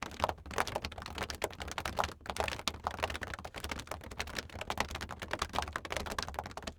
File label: Human Crowd Horses Few Horses